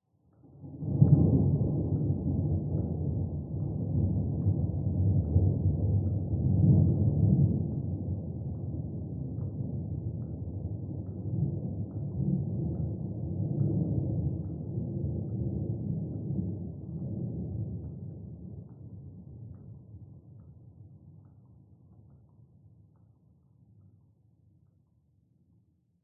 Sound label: Thunder Isolated Distant Low Rumble; ORTF; PCMD100